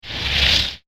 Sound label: Zombie Flesh Wet Whoosh; Zomby Sound Effects